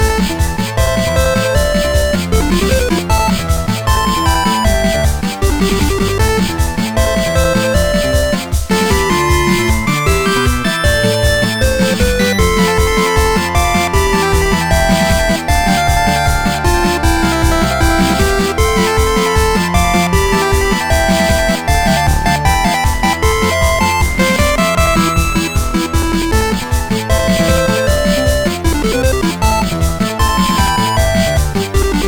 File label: Chiptune 8 bit